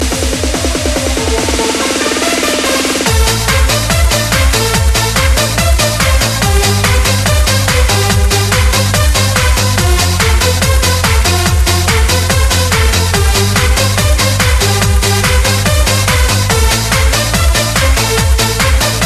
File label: Pumpin The Bass